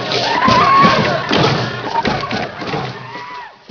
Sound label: celebrat